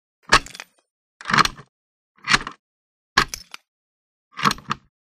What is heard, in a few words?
Door Latch Person Lat